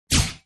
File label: Ship Shield Hit Ship